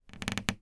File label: household door creak small wooden